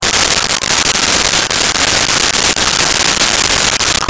Brush Teeth; Bathroom

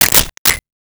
Gadget, Mechanical

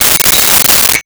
Metal Clang

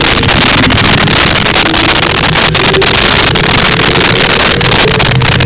pod varispeed loop

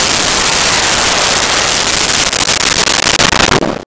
Missile Fly By, Weapons; 1001 Sound Effects

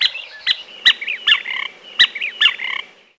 st fly cat

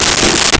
Garbage Can Movement; Office Supplies; 1001 Sound Effects

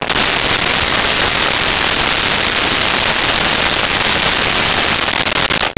Jig Saw

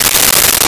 Tape Measure Closed; Tools

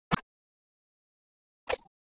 Water, Splash Jump In Water Splash, Cartoon; HB02 - Hanna Barbera

